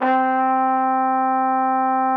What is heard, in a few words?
TROP C4, TRUMPET; Xsample VOL-3